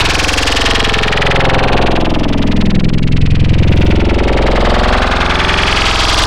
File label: Growl, wavetable